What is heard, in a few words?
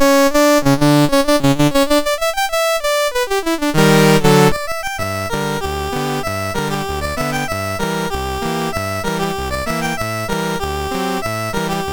Jazz Pizzicato, MOS6581R4; Dave Marquis